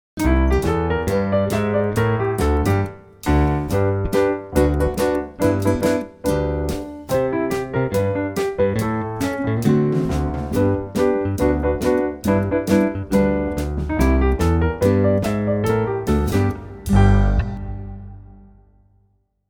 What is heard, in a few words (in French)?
basson; methode basson